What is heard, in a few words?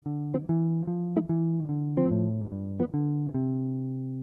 bass